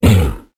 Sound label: melee